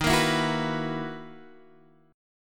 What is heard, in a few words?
B Major 11th D sharp, guitar